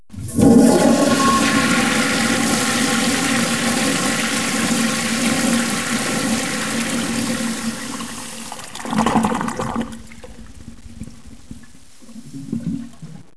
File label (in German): TOILETTE